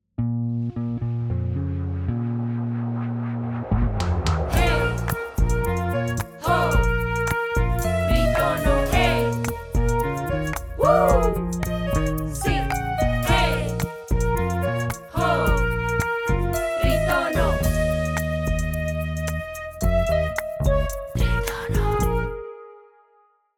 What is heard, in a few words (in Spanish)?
Cortinilla musical; TritonoPodcast